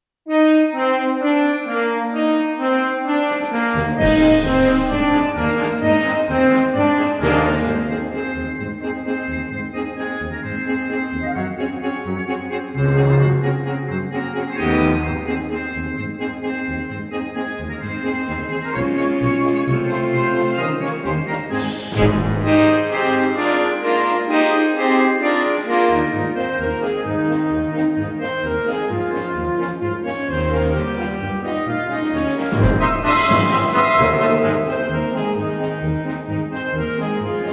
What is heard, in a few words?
jingle bells